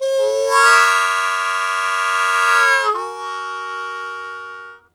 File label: Blues Chord Attack F#1, Harmonica; Zero G - Harmonica